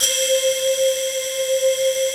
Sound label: FLUTTER PDS; TR PADS; Pro-Rec - Synthsonic